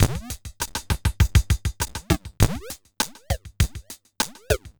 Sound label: Robo Beat 100BPM, sci-fi Beats